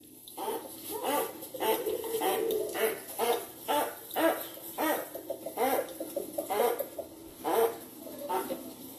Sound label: Squeaking rabbit